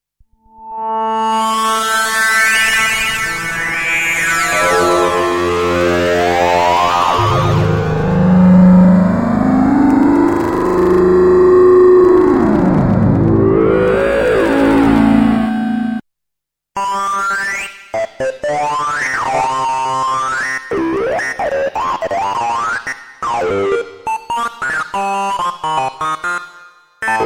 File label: TS-12; Ensoniq TS-12 digital synthesizer